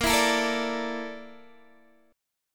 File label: Bb Major 7th Flat 5th Bb Guitar DADGAD